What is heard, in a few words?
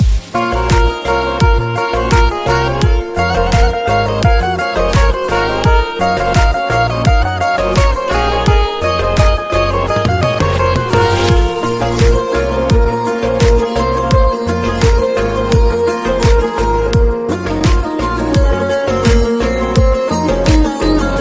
balochi tone